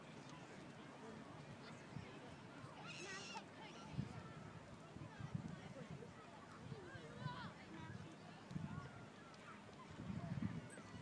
sound of west wittering beach in early april sandcastles and soades